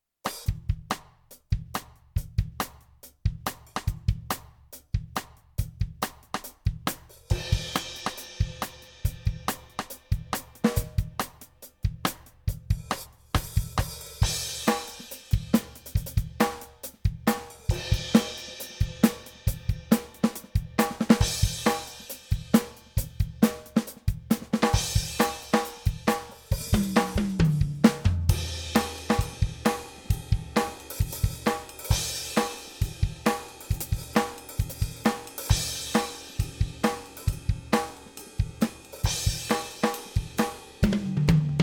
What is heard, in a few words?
Studio Reference Audio; PEARL eMERGE